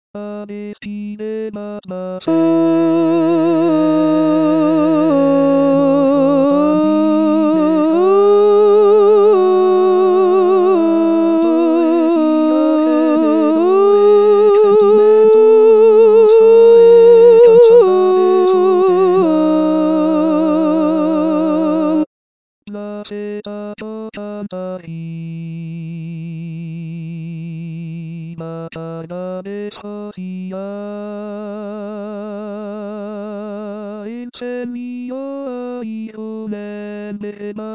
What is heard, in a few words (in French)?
xalbadorren alto